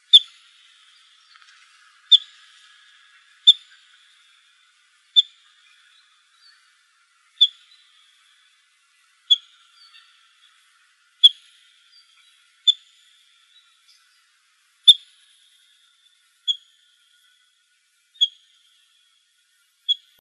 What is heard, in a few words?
ABTO CALLS, Emberizids; deviche